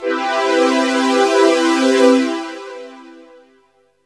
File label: Phat, Pads; Jungle Warfare 1&2